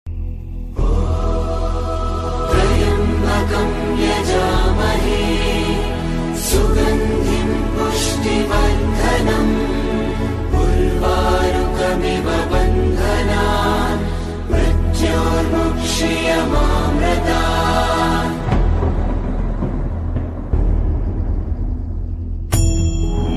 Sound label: Shiva Maha Mrityunjaya Mantra